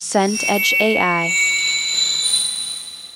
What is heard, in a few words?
sparkle shimmer